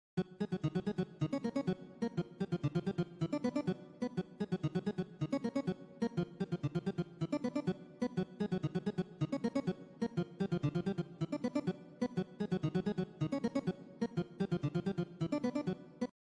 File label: rhythm example